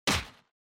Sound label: Motion Grab Dry